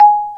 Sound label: Bass Marimba